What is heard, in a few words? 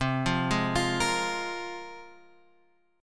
C 7th Suspended 4th Sharp 5th, C